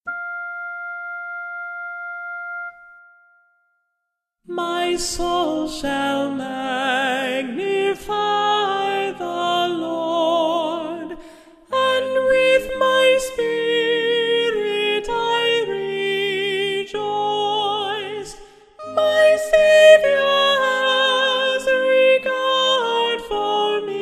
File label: magnificat soprano